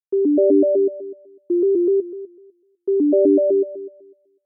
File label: ring